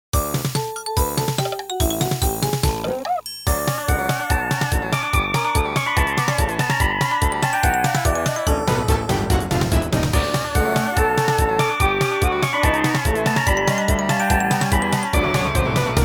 搞笑; 配乐类音效

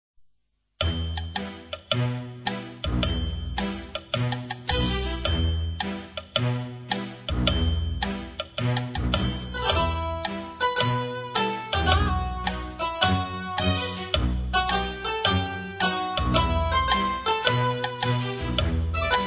轻松, 轻松搞笑背景音乐

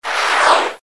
唰声转场的声音音效

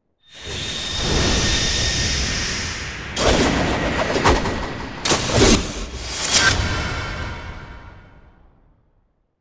快速移动

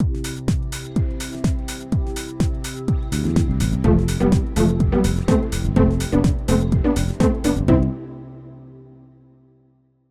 SFX, 片头音乐

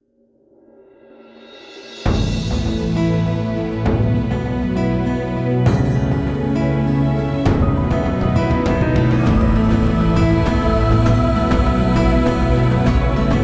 企宣常用, 企业宣传片常用宝藏背景音乐

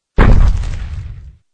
石头; 汽车音效

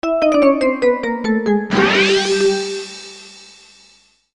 无奈结束

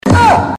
啊吃惊

